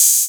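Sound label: 808 hi-hat; Wizoo - Platinum 24 Electronic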